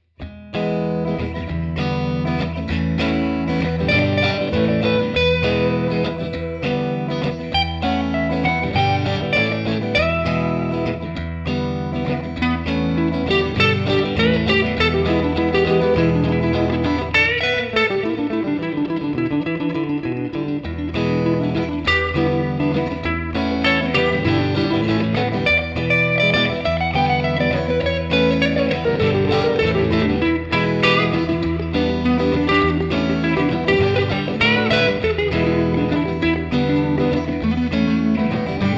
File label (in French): heptode dans wos cab deluxe reverb